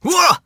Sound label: "woah!"; sonic; vc